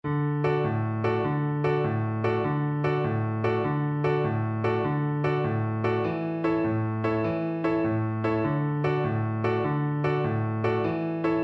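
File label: viola karaoke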